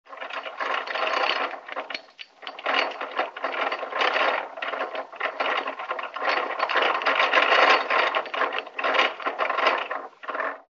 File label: Wooden cart